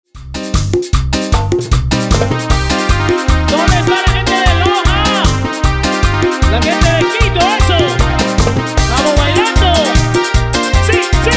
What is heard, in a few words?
INSTRUMENTAL RUMBA BPM 153; DIMAX DJ MIX